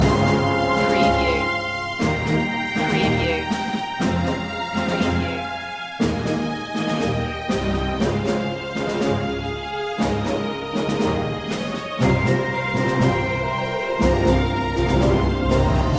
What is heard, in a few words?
the long march home loop